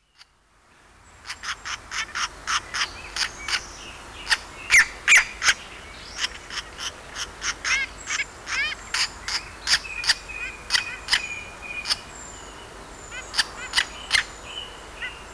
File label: Fledgling Green Woodpeckers